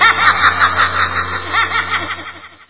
zhutkii smeh odinochnii zhenskii v prostranstve visokii

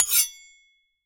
zatochka ostrovka klinka

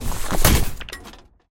neostorozhnij shoroh zashedshego v palatochnij lager zverya

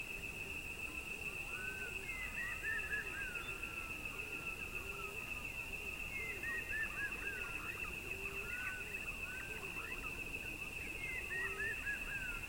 vechernee spokojnoe sverchanie sverchkov i otdalyonnie zvuki ptic

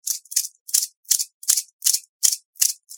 kabaca melodiya ritmichnaya

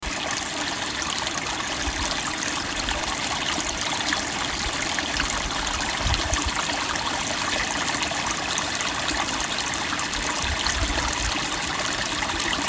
zvuk fontana

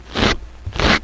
kot vilizivaet sherst shershavim yazikom

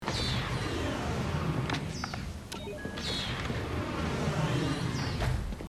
letayushaya tarelka

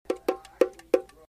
bongo melodiya razmerennaya

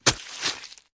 udar mechom i razrezanie zhertvi

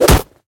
koronnij reshayushij tochnij udar

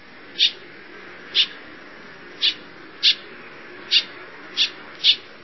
razdelnij krik tanagri krichashej u avtomobilnoj dorogi

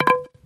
metallicheskij lyazg upavshego na kafel kasteta

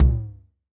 krasivo zvuchashij tom

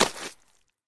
nastupit v luzhu bolotnoj gryazi

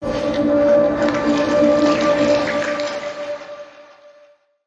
prizrachnij teleport v vodu

